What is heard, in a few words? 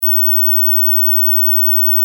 tone, mosquito; mosquito gehoor